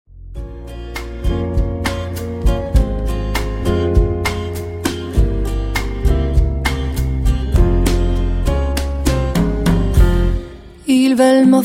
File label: Rumba 51